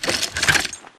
reload; wpn mgl; Titanfall 2

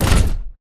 Footstep Jog Close; Titanfall 2

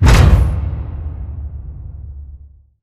pod door lg verb open stop; Titanfall 2

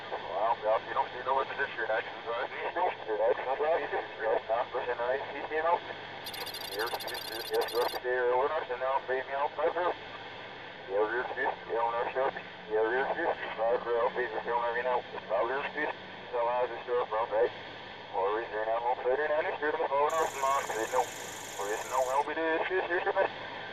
Computer Terminal; Blackwater; Titanfall 2

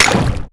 imp bullet lightballistic bubbleshield; Titanfall 2

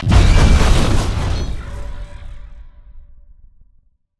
spectre overload; Titanfall 2

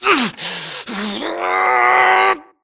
grunt Struggle; Titanfall 2

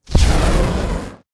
atlas dash close; Titanfall 2

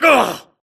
grunt hits; Titanfall 2

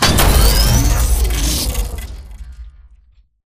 HUD Titan Battery Pickup Charge; Titanfall 2

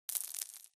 imp bullet lightballistic dirt debris

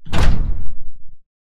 footstep generic core; Titanfall 2

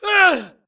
grunt death quick; Titanfall 2

